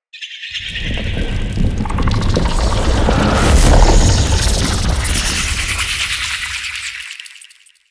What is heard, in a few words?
Root Spider Ambush Stinger; Animals; Spiders; Abilities